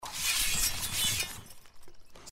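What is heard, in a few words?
cavalrymen draw sabres from scabbards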